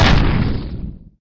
macro footstep

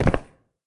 hit ground

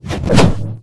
shotgun melee, weapons

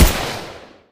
uzi fire, weapons

